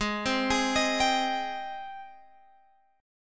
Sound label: Ab Major 7th Ab, Guitar Open G; 18,17,18,20,20,x; down